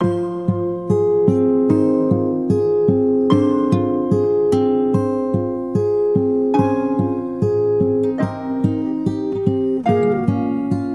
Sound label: guitar, Loops, Sad